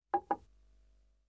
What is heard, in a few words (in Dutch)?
Neerzetten Glas; KMP Film Video Sound Effects